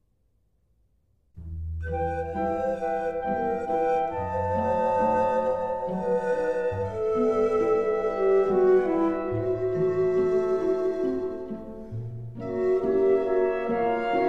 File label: Slavonic Dance in E minor